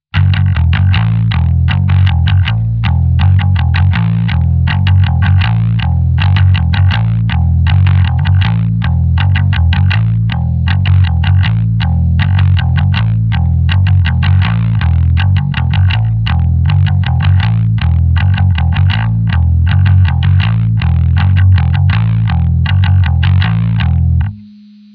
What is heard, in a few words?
bass